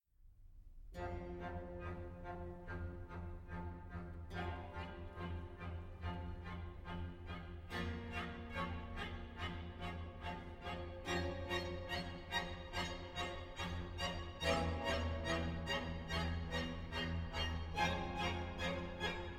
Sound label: Violin Concerto in F Minor Allegro; The Four Seasons; Op. 8 No. 4, RV 297 Winter; Non Molto